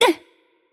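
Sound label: Hurt Light, Korean; bloom minigame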